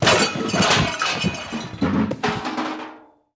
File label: Comical Crash Clum, crashes